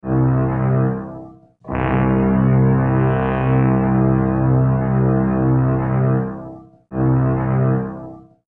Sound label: Anchor indicating possibility of collision; colregs